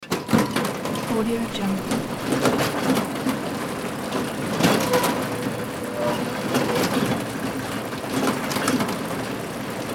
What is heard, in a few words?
hay elevator start run; hollywoodedge